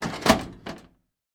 office filing cabinet metal close; sound effects one

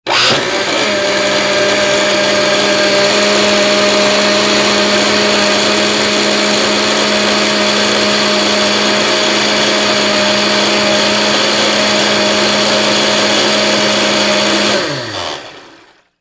household electric blender start run stop mixing; sound effects one

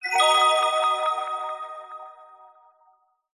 fantasy magic ping wand 90s style dreamy

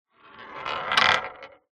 industrial metal ball bearing roll on wood

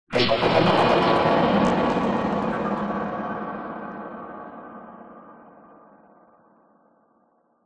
cinematic hit crash loud bright synth long tail; sound design

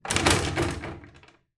household boiler control panel door drop down

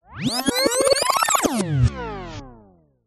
musical music rewind

